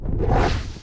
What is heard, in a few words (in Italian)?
Soldato Enorme; BLIND QUEST - The Enchanted Castle